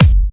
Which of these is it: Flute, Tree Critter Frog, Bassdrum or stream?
Bassdrum